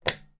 household caravan bathroom sink light switch press on or off